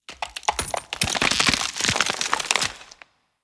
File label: Clink; blue archive